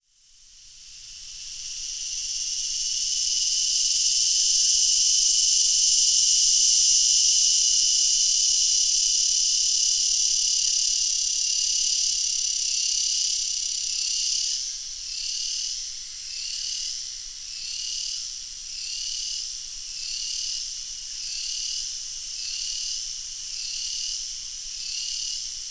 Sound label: animals insects cicada crickets summer day australia; zapsplat